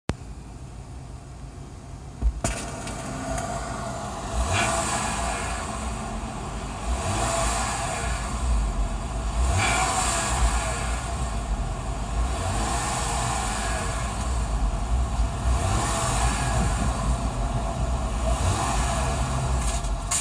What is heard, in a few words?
power steering belt causing vibrating noise; 2g tl